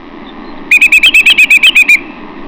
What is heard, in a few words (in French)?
courlis corlieu